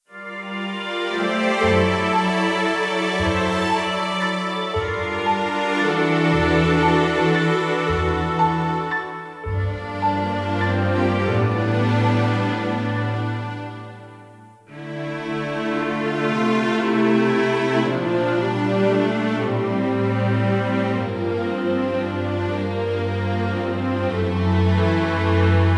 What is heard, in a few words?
Grief Instrumental; Fire Emblem Fates